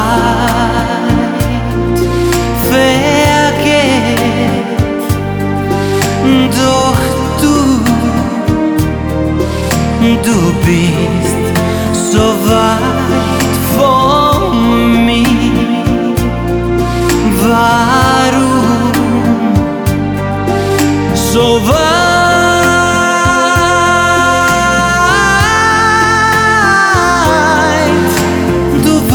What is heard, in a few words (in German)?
Deutsch